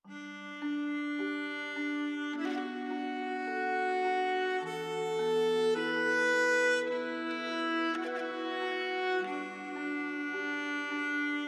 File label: Without Violins; Unravel gamerip 2016